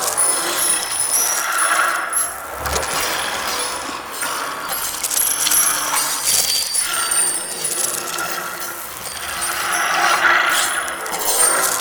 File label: Hourglass Pick Up Loop; Warframe; Duviri Murmur; MITW